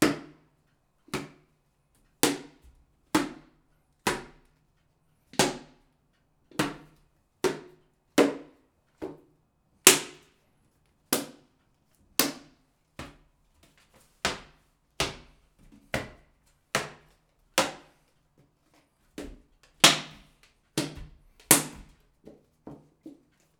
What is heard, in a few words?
Plastic Bottle Hits Body; Fairlight Sound Lib